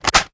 reload empty magin; viper

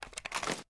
ar gl reload fast end; weapons; gmod; viper; scharlie

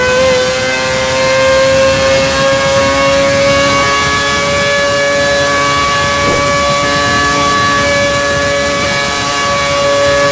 fourth cruise, vehicles; gmod; tdmcars; furai

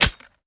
plr sm beta reload fast mag in; gmod

